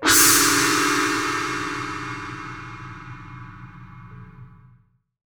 Crash, impact samples

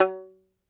Tenor Banjo, Plucked Strings

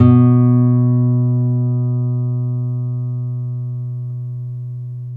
NYLON P B1, GUITARS; Synclavier Sampler Library

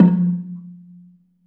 BELA F2, BELAFON, Asian Traditions; USB Soundscan vol.60; AKAI

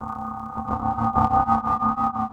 war bell, Drum N Bass; Sonic Foundry (Sony Creative Software); Knutrix Drum & Bass

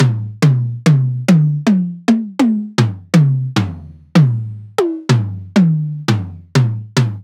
707 mod Tom

